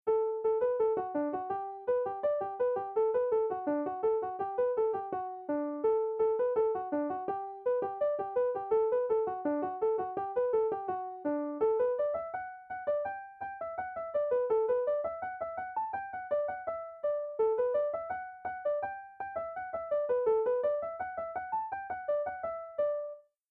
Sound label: session, irish music; rolling in the rye grass